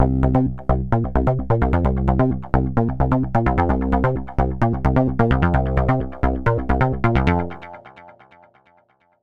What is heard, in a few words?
Bass loop 130bpm B flat